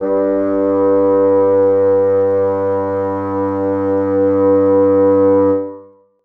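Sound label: bassoons sustain g2; Sonatina Symphonic Orchestra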